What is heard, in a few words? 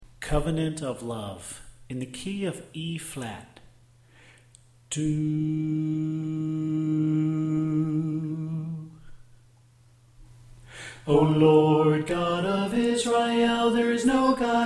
Tenor Left